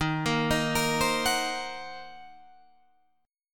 D sharp Minor 6th D sharp down Guitar Drop D

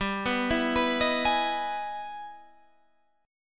E Major 7th; Emaj7/G; arpeggiated; JGuitar

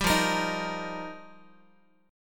F 7th Suspended 2nd Suspended 4th, Guitar; F7sus2sus4; strummed; JGuitar

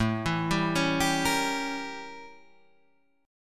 A 7th Flat 9th; A7b9 arpeggiated; JGuitar

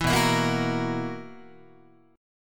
B Major 7th Suspended 4th B, Guitar; strummed

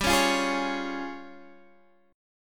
Db Major G; strummed; JGuitar

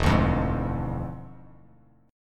E 7th Flat 9th, Bass Standard, E7b9 chord; strummed

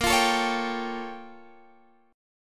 Bb Minor Major 13th, Guitar, Half Step Down; BbmM13

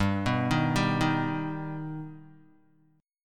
G Augmented G down Guitar; G+ arpeggiated; JGuitar

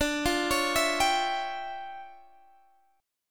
D Minor Major 11th, down; DmM11; arpeggiated

